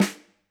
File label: Snr, drum